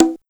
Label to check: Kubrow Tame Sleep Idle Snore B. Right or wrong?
Wrong